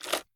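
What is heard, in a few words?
gear rattle weapon large; viper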